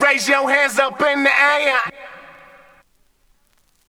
Vox, Trance; Sonic Foundry (Sony Creative Software); Trance NRG VOL-1

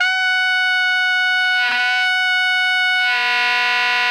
TENOR F#5, saxophones; Greytsounds; Philip Wolfe David Crozier's Saxophones

